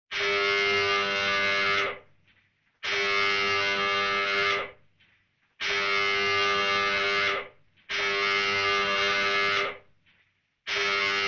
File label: Signal, alarm on a submarine